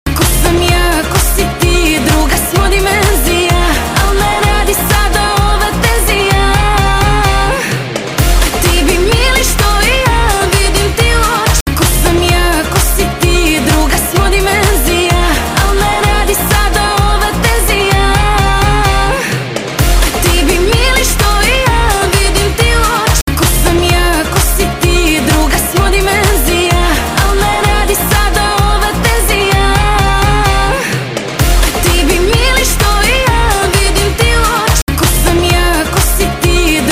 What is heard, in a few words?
Turbo i Folk